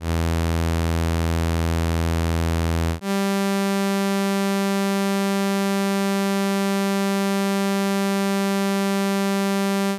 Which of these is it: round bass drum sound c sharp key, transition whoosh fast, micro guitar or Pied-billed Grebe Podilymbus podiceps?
micro guitar